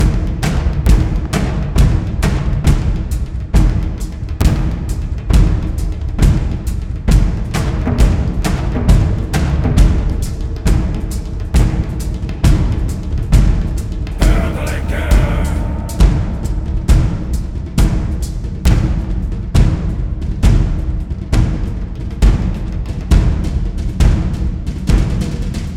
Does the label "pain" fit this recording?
No